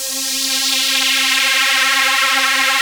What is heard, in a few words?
SYNTHEX PHASE; East West - Global Sounds; CEE SYNTH